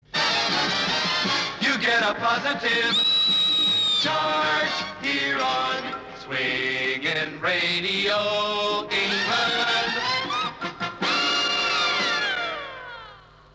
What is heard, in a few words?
jingle - you get a positive charge